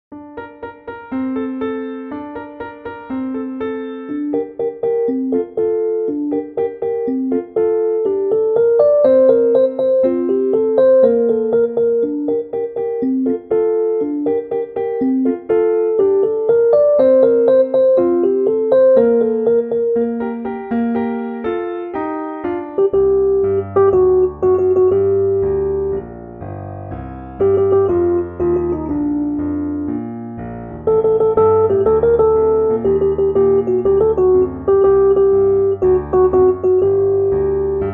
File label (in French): voix instrumental et melodie